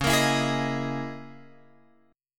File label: C sharp Minor 6th Add 9th, Guitar Open F; strummed; chord; x,8,10,11,11,10; JGuitar